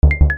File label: nav infantry; Desert Storm WMD Sweeper